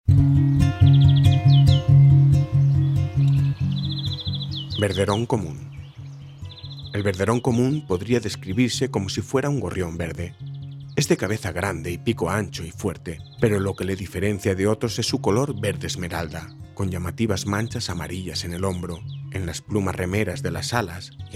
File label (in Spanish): Verderón común (Chloris chloris); aves; lavanderas